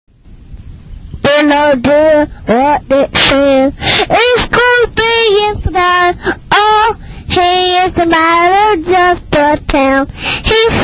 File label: jingle bells